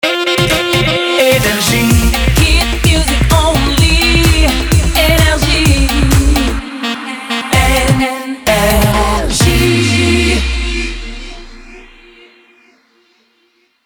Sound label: jingle; NRJ